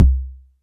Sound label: FM Perc C2